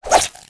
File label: King Spider Atk; Seer; GamigoNA